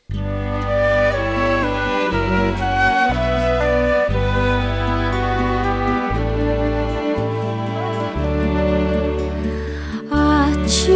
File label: Duet